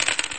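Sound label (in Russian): бросок игральных костей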